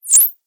squeeze

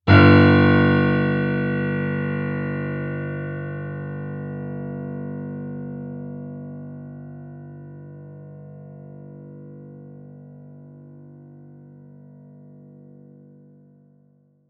dramatic one note ringtone; notification sounds

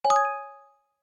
graceful, message tones

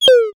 fallin, notification sounds

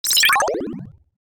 begging for more; sound effects

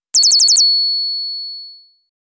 ringing chirp; standard ringtones

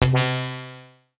granules; sound effects

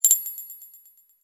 tiny steel ball; message tones

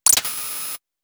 cable unplugged; sound effects

